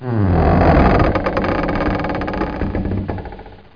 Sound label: creak; Heretic II